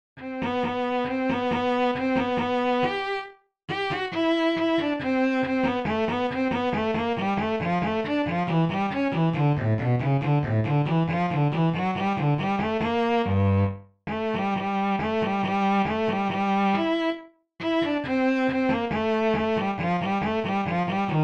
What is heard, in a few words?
Bass, Sym Orch, Mozart 40